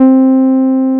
TB-303

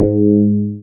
BAS FM DX-7

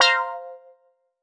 Q Stab C, quart stabs; PowerFX - Nu Jazz House

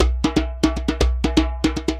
120, MIXED DRUM LOOPS 120

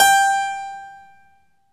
KEY HRPCR, Roland; Roland - LA Composer vol. 1

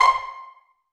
STR PIZZ C4 MF; Sound On Sound - Peter Siedleczek's Orchestra

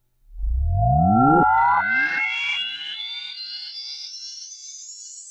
Reverse Fx; musicradar

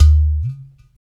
UDU, African Contemporary; Udus; Spectrasonics; Supreme Beats

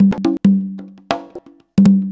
CONGA 119, Modern Percussion Loops

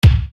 Bass Dr, EuroDance Bdrums

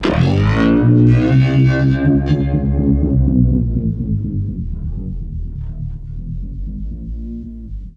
REV, Guitar

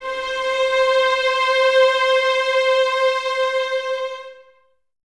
violins C 5, String Ensembles, 23 Violins; Miroslav Vitous

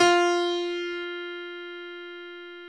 PNO F3, DANCE PNO

